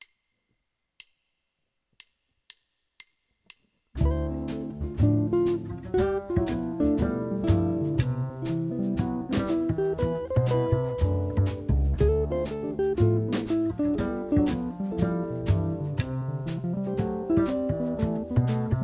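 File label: II V I in C backing track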